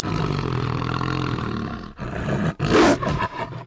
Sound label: wolf